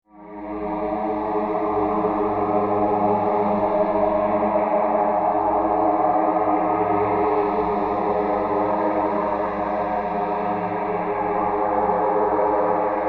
Drone Horror Tonal Tension Builder Haunted Ominous Suspense; Scary Soundscapes (Sound Effects:Horror)